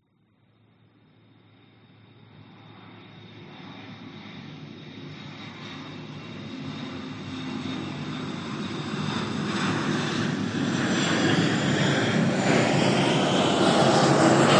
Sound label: vehicles airplanes military f15 or f16 fly overhead